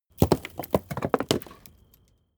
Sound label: stone medium fall; natur-tiere; steine